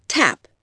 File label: TAP